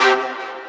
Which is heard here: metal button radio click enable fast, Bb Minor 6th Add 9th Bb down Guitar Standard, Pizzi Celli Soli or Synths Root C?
Synths Root C